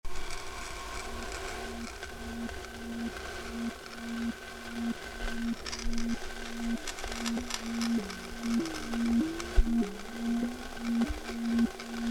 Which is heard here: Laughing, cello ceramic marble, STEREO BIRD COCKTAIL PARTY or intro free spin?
cello ceramic marble